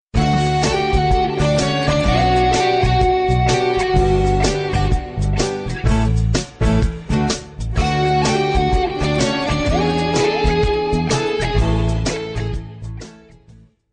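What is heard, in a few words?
Instrumental background music Telugu Ringtone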